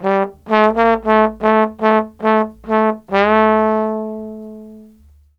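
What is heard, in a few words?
TROMBONE SOLO, BRASS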